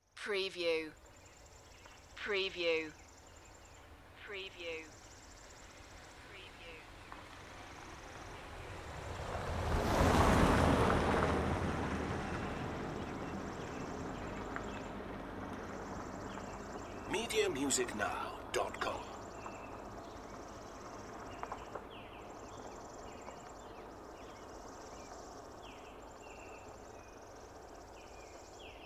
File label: Auto Pass By Dirt; sound effects bible